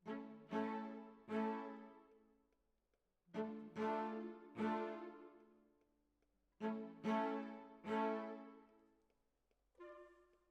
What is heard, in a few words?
Cellos